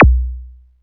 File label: kick